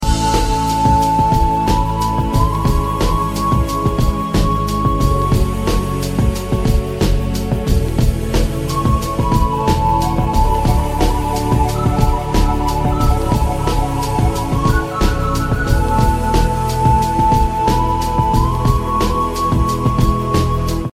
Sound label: magic flute